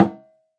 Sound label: conga Tip; ETHNIC and WORLD PERCUSSION